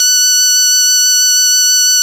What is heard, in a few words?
VOX F#5, VOX CONTINTL, Vintage Keyboards; Big Fish Audio; First Call Vintage Keyboards